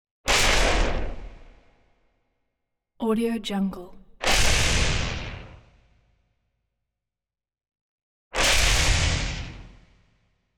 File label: lightning strike sharp whipping bursts; prosoundfx